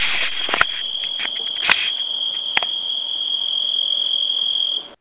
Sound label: Oecanthus pictus - 22degree Celsius